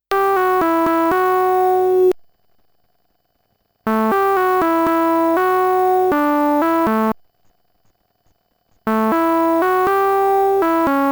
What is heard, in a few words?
PWM Filter